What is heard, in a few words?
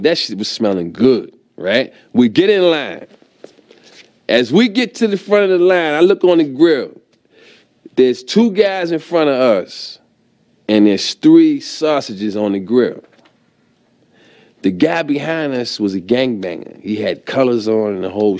RAP